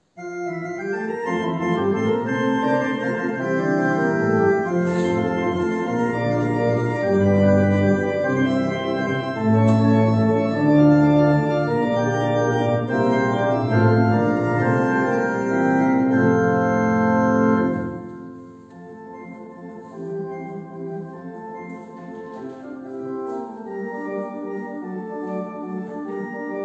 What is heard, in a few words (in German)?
Orgelnachspiel; loeserkirche wien favoriten